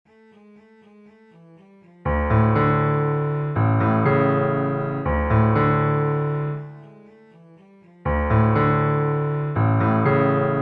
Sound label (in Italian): violoncello; per elisa